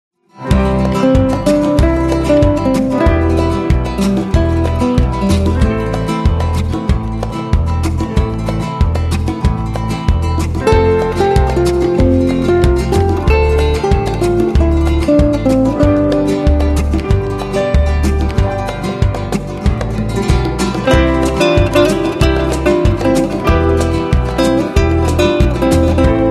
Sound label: guitar island rising sun